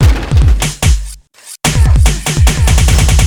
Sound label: Drum Loop